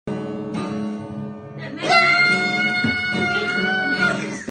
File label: guitar